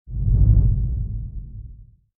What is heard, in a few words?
Swoosh